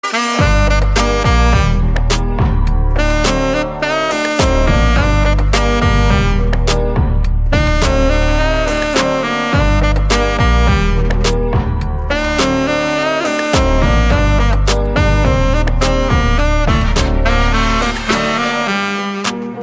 saxophone cover

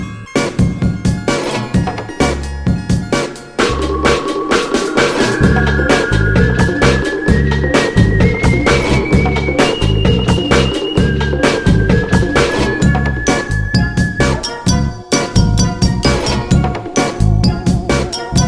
instrumental ringtone

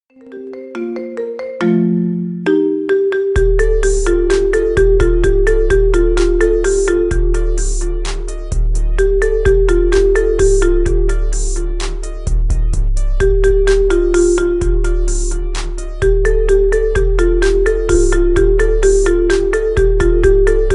marimba remix

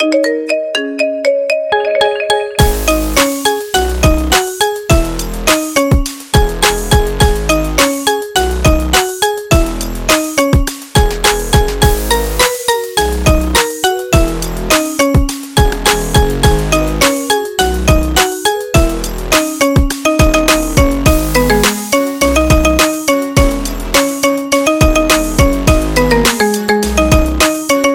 marimba remix ringtone

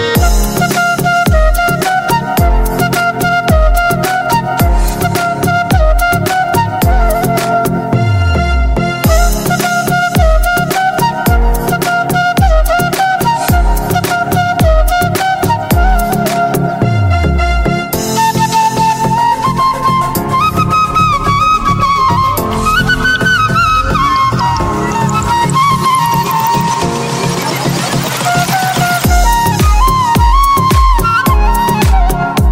flute ringtone

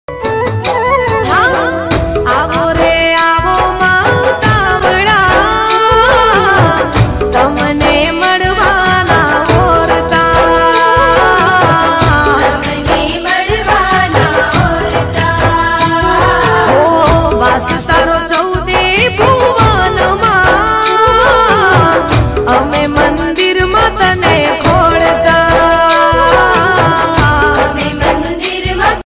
gujarati song ringtone

